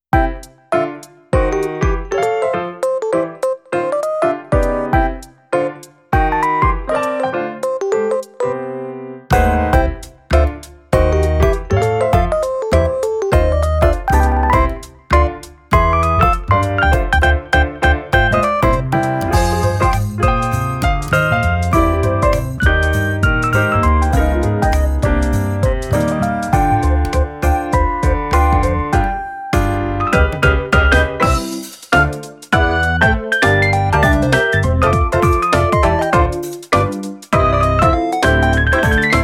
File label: ragtime loop